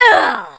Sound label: pain; alienarena; invader